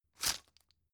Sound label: drug pull out hard; berufe; arzt